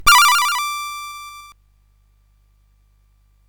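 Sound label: Moon Patrol, MOS 8580 SID chip music, games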